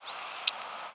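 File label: Smith's longspur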